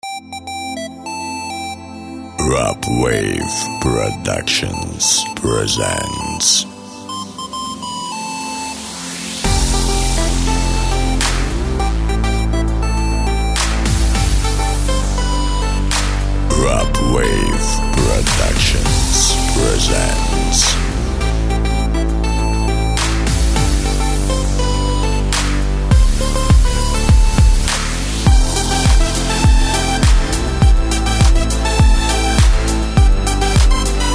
Pop Minus

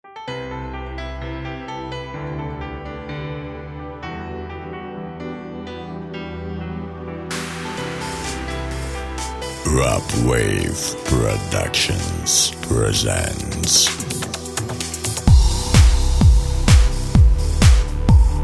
pop dance

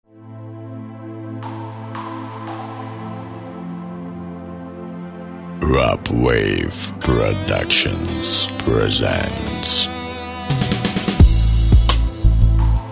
light pop rock

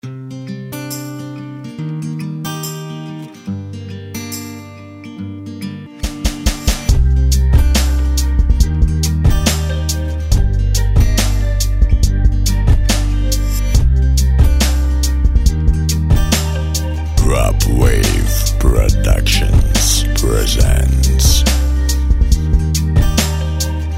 new guitar; Kisssound

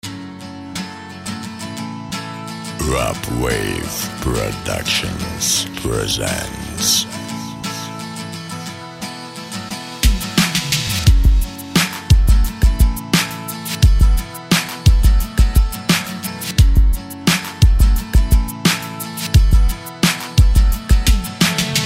rock hip-hop, minus s gitarami

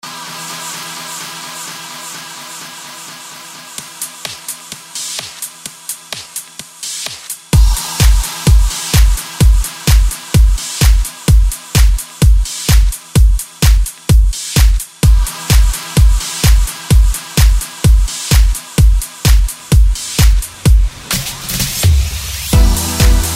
dance instrumentals; pop minusovki; tantsevalnyj minus

